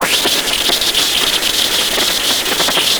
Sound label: static glitch; slender; arrival